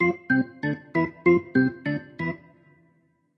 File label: Organ; Fourth of July 2019